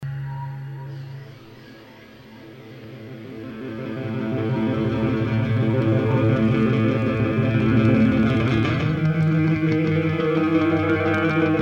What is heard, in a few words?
Improv- Two Sticks